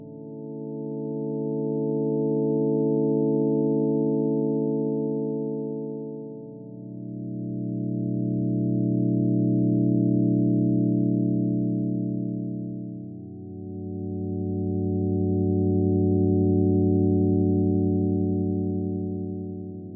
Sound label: atmosphere